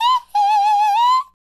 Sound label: Hee Hee, Hip-Hop and RnB Vocals